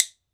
WOOD BONE, Ireland

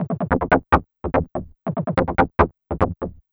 Percussive

